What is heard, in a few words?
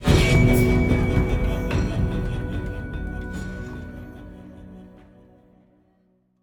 sting Demons; the walking dead michonne 2 give no shelter